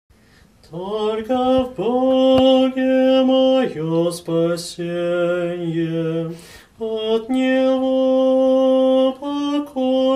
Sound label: tenor; tolko v Boge